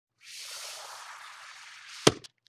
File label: Wet Squoosh Pop; Vintage Cartoon; Hits & Tones